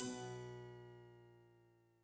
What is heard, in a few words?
Rave Ride